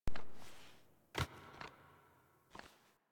Foley Get Up; Teshin; Warframe; The War Within

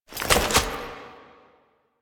Sniper Reload; DE; Warframe; Frumentarius; Exalted Sniper

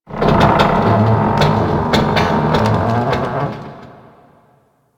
Ice Metal Groan; Ambience; Warframe; Corpus Ice Planet

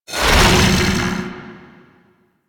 Fusion Pillar Cast; Warframe; Warframes; Qorvex

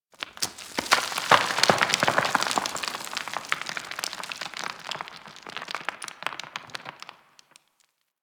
Mining Rock Debris A; Warframe; Ambience; Grineer; Grn Mining Shaft

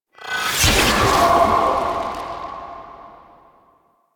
Exalted Blade Deactivate; Warframe; Warframes; Excalibur Umbra

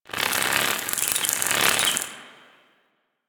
Winged Insect Passby; Ambience; Narmer Outpost; Warframe; The New War; Quests

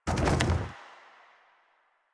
Pylon Plastic Roll; Warframe; Nineteen Ninety Nine

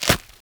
Agaric Mushroom Grow; Objects; Pickups; Resource Materials

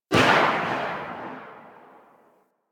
Bladed Pistol Tail; Warframe; Tenno

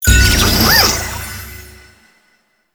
Nokko Mushroom Captured; Warframe; Nokko Colony; Mushroom Hunt

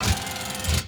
Mech Servo Fast; Nechro

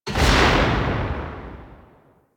Hand Shotgun 2D Tail, Weapons

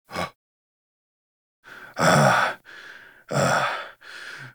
Shotgun Breaths; Quests; The New War; Grineer Eidolon; Kahl

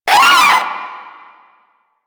Proto Infested vocal short attack; Warframe; InfestedNinetyNine

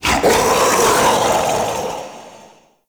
Male Vocal Charge Scream; Characters; Enemy; Vocals; Mockeries

